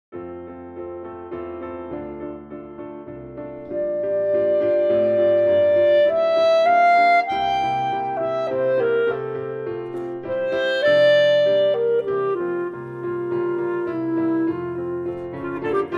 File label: Full Clarinet